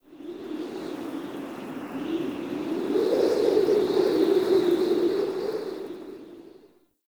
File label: Skylight Wind Howl Gust; Ambience; Dungeons; Under City